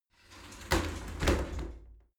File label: shower slide door close regular